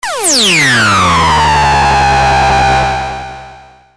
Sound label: glide; neptune